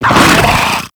Infested Ancient Attack Grunt, Enemies; Warframe

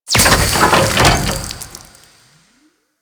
Rescue Hatch Open; Warframe; Grineer Ocean

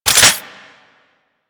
Reload, Weapons; Strun Prime

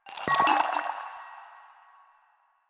Vial Foley; Warframe; Lasrian; Lasrian Soldiers; Footsteps; Lasrian Goonadier

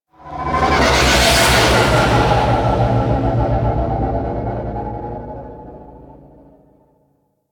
Fly By Slow; Nora Liset; Liset Flight

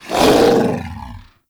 Intro Sfx Roar; Warframe; Ehra Rok

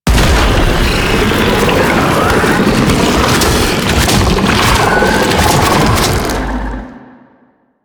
Octopede Spawn, Sounds Enemies; Warframe; Man In The Wall

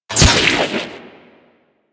Microwave Pistol Shot; Grineer

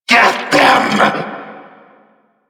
Vocal Player Spotted; Warframe; Duviri; Dax; Vocal Set B

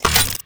Mech Aim Start; Nechro Mech; Vehicles

